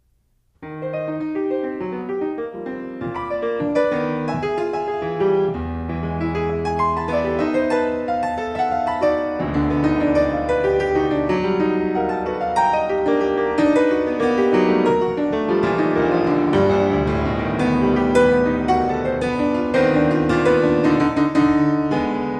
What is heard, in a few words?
horn